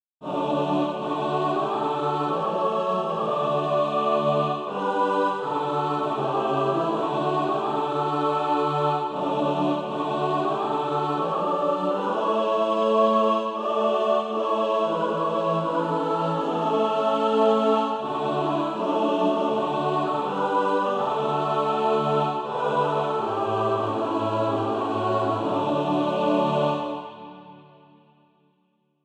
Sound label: mixed; SATB